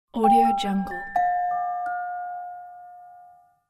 welcome ding; interface sounds

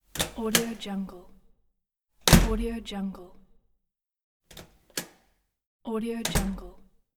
metal door open and close; domestic sounds

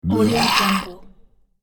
zombie scream snarl

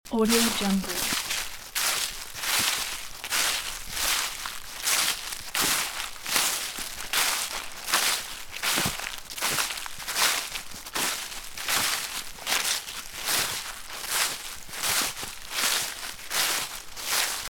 walking in dry autumn leaves in woods loop; راه رفتن میان برگ های; human sounds

